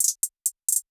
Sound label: Hihat Roll (Evolved) - 132 BPM; Drum Accents; Cymatics; GHOST (Beta Pack)